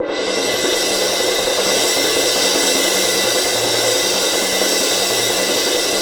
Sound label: CYM, Percussion; ILIO; Synclavier Percussion 2; World & Orchestral